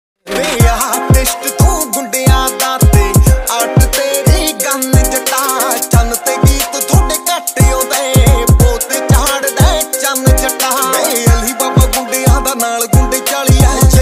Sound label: Punjabi Ringtone